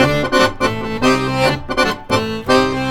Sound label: ACC, Balkanish Traditions